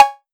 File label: cowbell